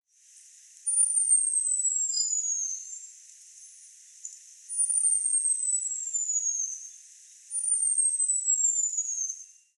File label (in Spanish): Colibri Chispita Volcanera (Selasphorus flammula)